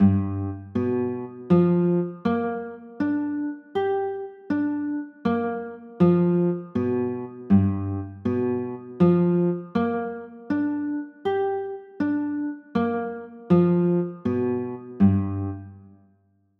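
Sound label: G sharp 7 chord, guitar; g 7 sharp 9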